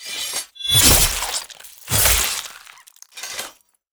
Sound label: kill; Aragami